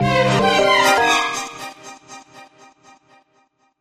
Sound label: Cool Disco Logo